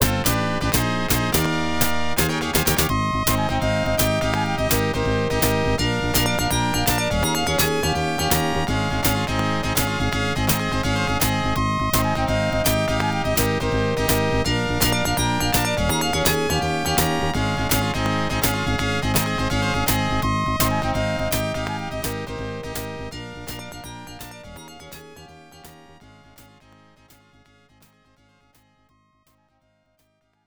Match Won; super volleyball; genesis

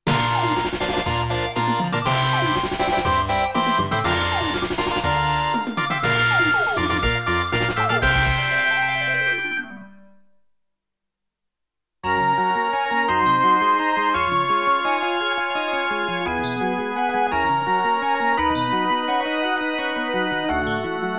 Game Clear~Fanfare~Ending; Captain Commando; G.S.M. Capcom 5

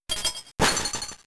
Ice Ball

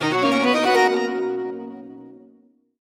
Home Menu Banner; Picross e collection 2011-2018

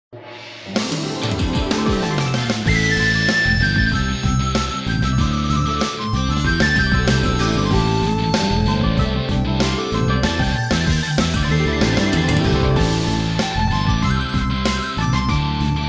Bagua Music; M.A.T. Mission Against Terror; MAT 1 Online; windows

